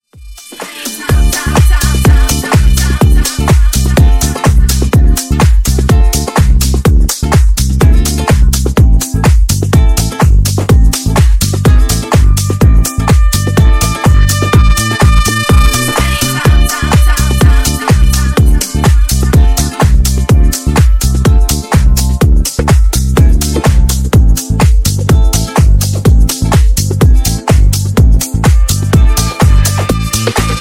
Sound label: Funk Remix 125 Bpm